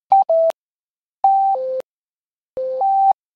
Sepura Radio

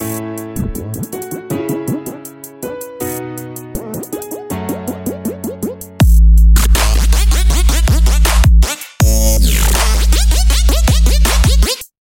Optimistic Piano

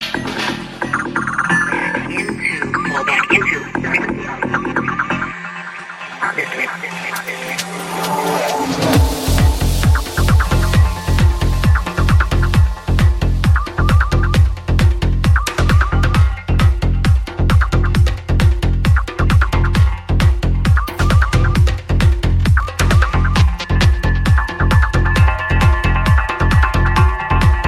Sound Psytrance

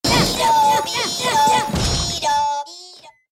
Bee-do; Despicable Me

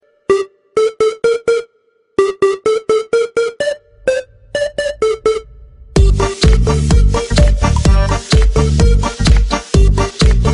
Narcotic Sound

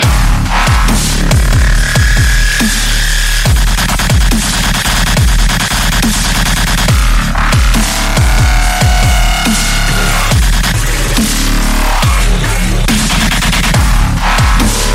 Horror Metal

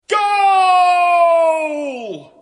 goal; 365scores

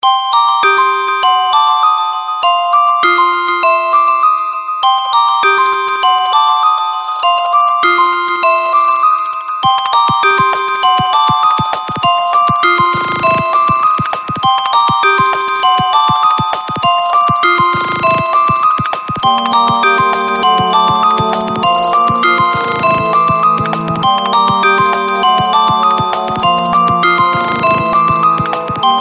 Sharp Keys

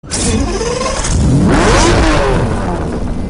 Lamborghini Countach

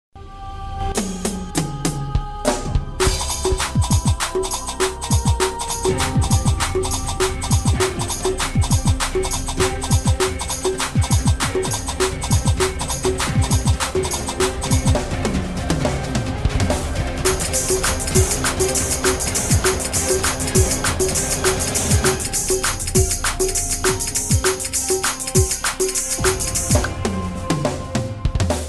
Break Mix

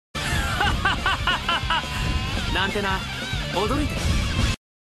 Hah

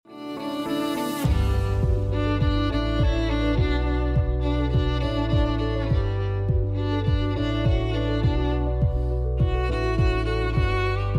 Violin Sentimental

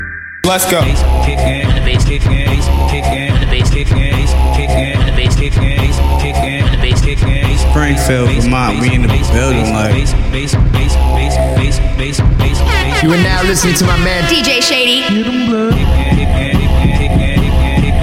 Bass Kick My Vocals

